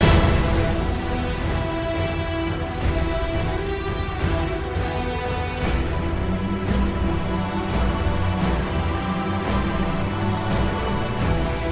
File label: Jazz; Transformers The Game